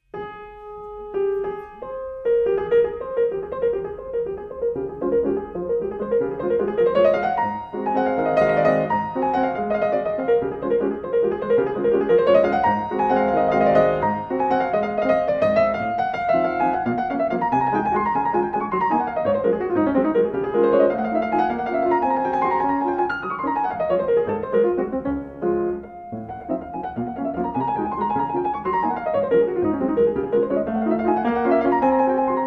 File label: Minute Waltz